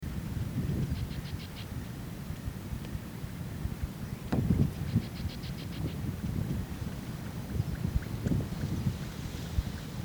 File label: grey cheeked grass finch